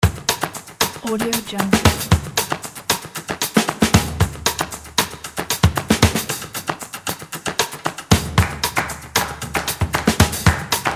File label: creative drums